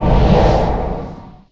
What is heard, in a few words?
morph; spells